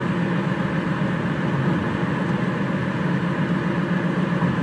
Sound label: Cupped Tire Sound; Cadillac XLR